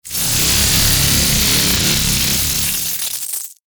電撃 魔法

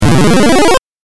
8bit 上昇

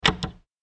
仕掛け作動; ボタン・システム; システム音

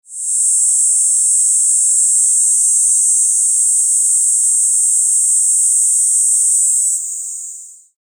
ニイニイゼミ

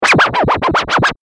スクラッチ

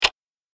置き時計 の 秒針 単発

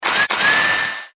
中型ロボットの駆動音

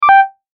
決定

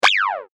ショット

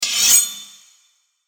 抜刀

